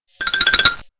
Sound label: comic sneaky; geraeusche virtuell